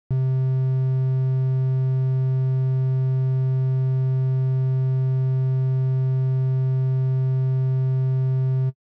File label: Triangle C3